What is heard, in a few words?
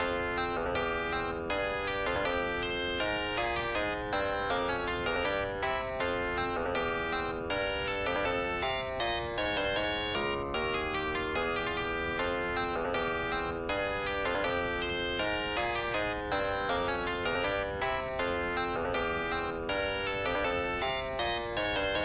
Jukebox Classical; Minit gamerip 2018